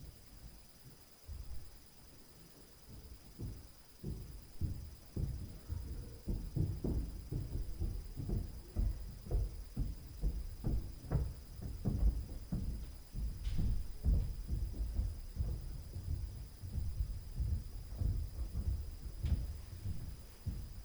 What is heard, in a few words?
STAIRS + UPSTAIRS NOISE, Stairs & Floor Noise; Night Noise